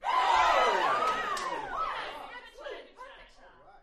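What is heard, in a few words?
Crowd 15 Interior Rowdy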